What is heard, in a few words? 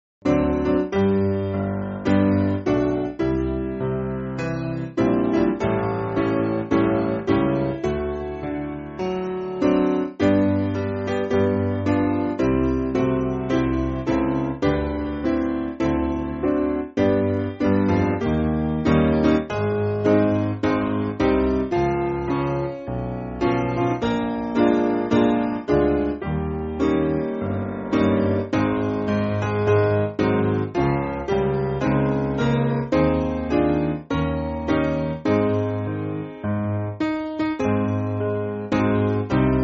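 Piano, Music Backing Files for Churches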